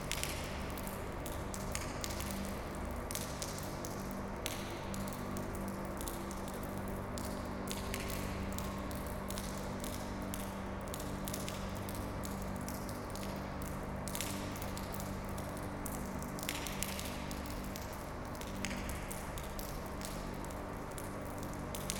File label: Drops Underground Refuge